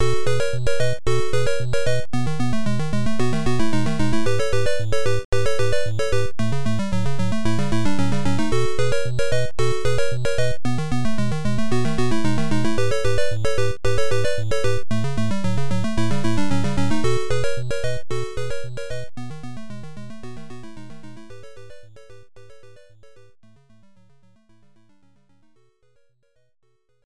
Special Power-Up Get; bomberman nes

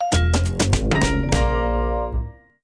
Win Sting; curious george downtown adventure macos windows gamerip 2002

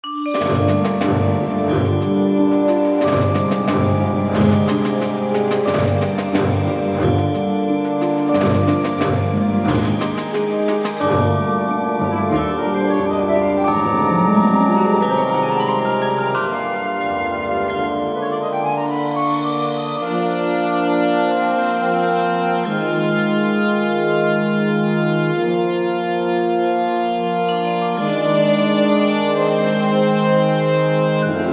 Tension; Fire Emblem: Radiant Dawn

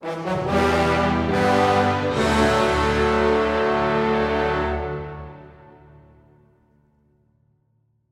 Level Up Stinger

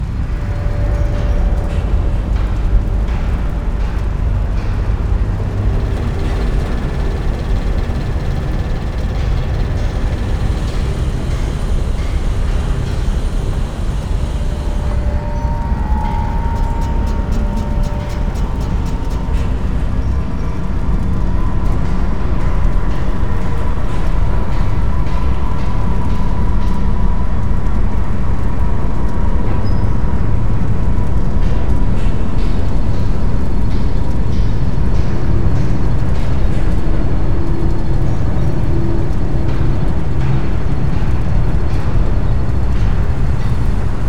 Level Lava Forge; Dungeon Hunter; android game music

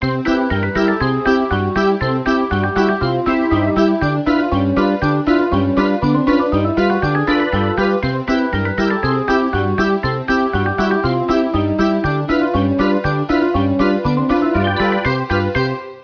Ride; theme park

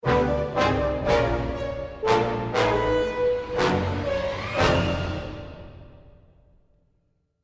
Bad Stinger; LittleBigPlanet 3; PlayStation 3

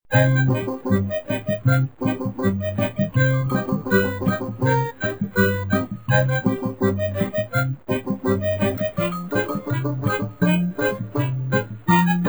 Radio Song; Smeshariki Museum of Communications

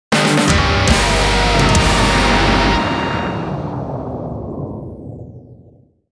Defeat; Advance Wars 1+2 Re-Boot Camp

